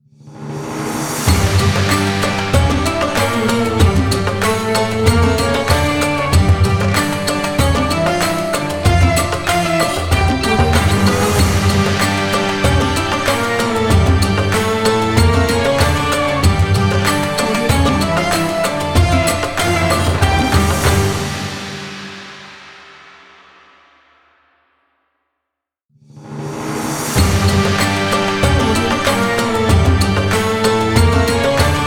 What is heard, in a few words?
ramadan music intro logo